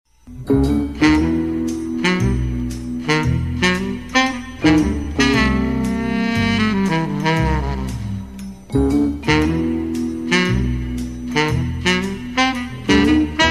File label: Phone ringtone